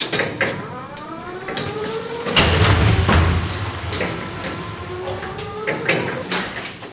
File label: Forklift Elect Mvmt M